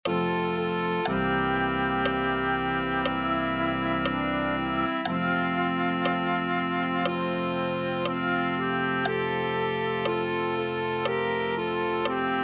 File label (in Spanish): clarinet1